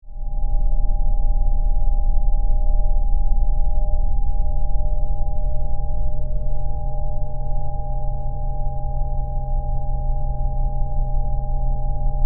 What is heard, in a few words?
Drone Energy Source, Scifi, Drone, Energy, Source, Flutter, Science, Fiction